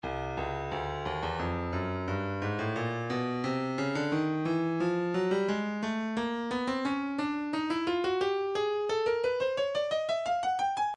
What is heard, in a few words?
breakbeat 088 bpm; Stylus Breakbeat Midi Files 085 089 Bpm; Watts Towers C